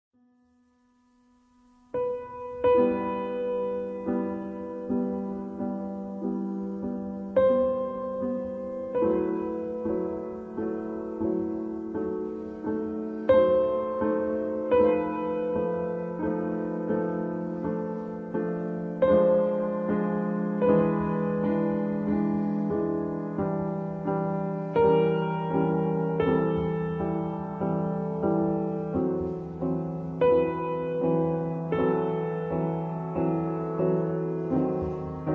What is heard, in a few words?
prelude in e minor